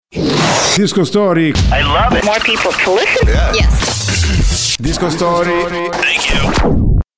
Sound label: Spot Disco Story IN